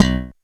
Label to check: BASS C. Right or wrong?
Right